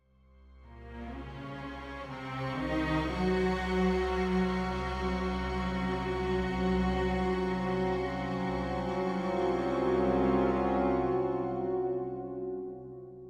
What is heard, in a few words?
Spooky Ship Mood